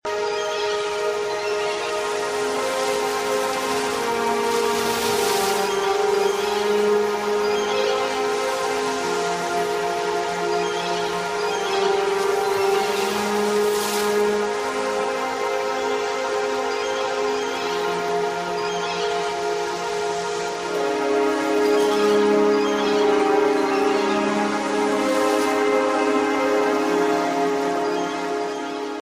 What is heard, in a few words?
soothing music tone ringtone